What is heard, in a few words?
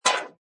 Click Musical Whoosh Fast, Scifi; Scifi Production Elements, Set 2; Lynne Music